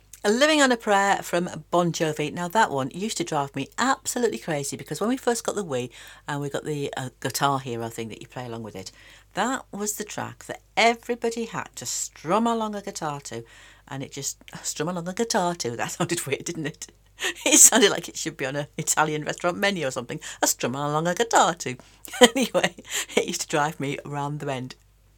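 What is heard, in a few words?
strum along a guitar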